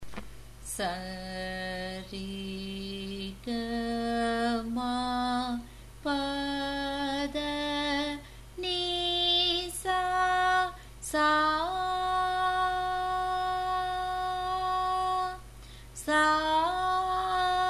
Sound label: Thara Sthayi, F sharp; Thara Sthayi Varisai in 4.5 kattai (F#, F-sharp); Carnatic Music Basics